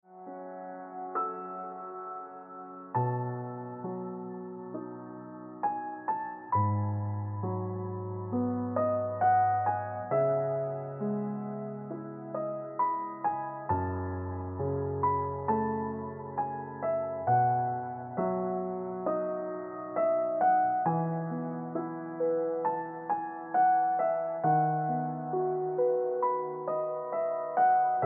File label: Ringtone Piano Music